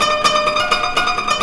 KORA; Zero G - Ethnic

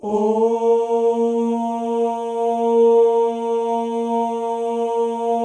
male ohs; Spectrasonics; Symphony of Voices

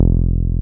303, TB-303 BASS

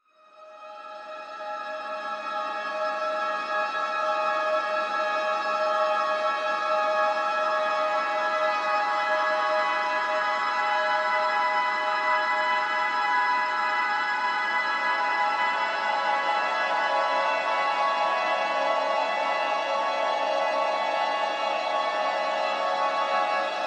depths pad

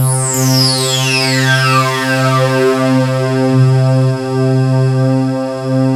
SWEEP; Syntec; Wall of Sounds

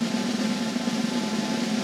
CR, Vintage Snare Drum; Q Up Arts; Johnny.C's Vintage Snare Drum; 5X14 RODG

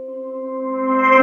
REV Steel DR, Roland JV-2080

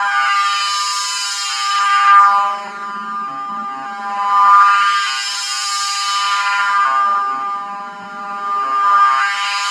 rock chair, rockin chair; Spectrasonic Distorted Reality 1

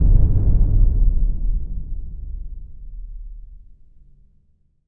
sonic boom; Spectrasonic Distorted Reality 2

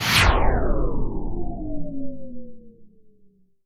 Misc Short FX

